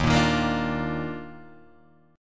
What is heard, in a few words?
Eb Major 7th Suspended 2nd Eb Guitar Drop D